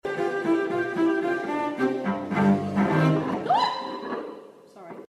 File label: rehearsal slip